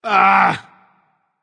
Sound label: ugh; smite; voicepack; sobek